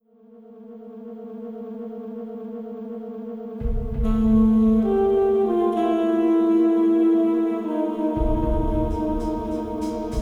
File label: Spooky Jazz Decay